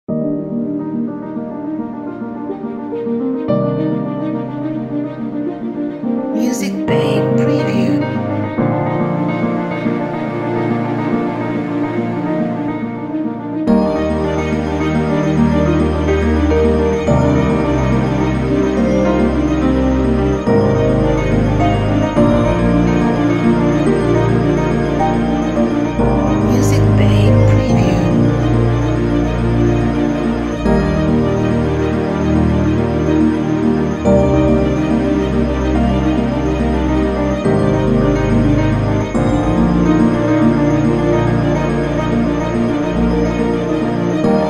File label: Inspiring Piano Flight